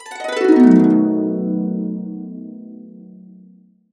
B, Harps; USB Soundscan vol.62; AKAI

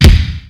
k1, Kawai K1 Drum Machines; 300 Drum Machines

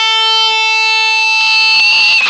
OVER SCREECH, Funk Guitar; 90 s Sample CDs; Zero G

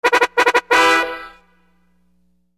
Horn Loop, Hip-Hop; Construction Kit; Sonic Foundry (Sony Creative Software)

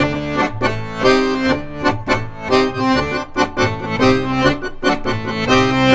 ACC, Balkanish Traditions; USB Soundscan vol.63; AKAI

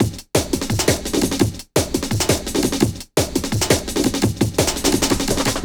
Some Drum, Drum & Bass; Fluid Dynamics

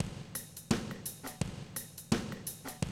Classic DrumLoops; Best Service; XXL 1500 Classic DrumLoops; Sample CDs

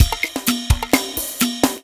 LATIN, 118, Dance Trax loops

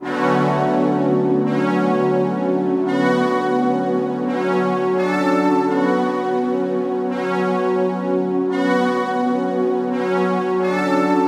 Synth Stringpia; Sonic Foundry (Sony Creative Software); Knutrix Drum & Bass

